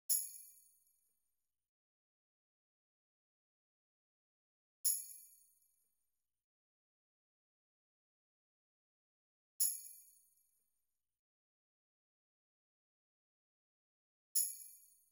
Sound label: Beat Starter Perc